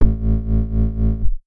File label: bass